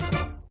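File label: fanfare end of ball, pinball; WILLIAMS; INDIANA JONES; Indiana Jones Original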